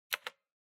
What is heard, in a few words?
PC-Keyboard (Enter-Mid)